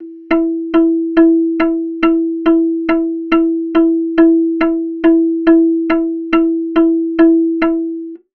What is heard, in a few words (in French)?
Sautille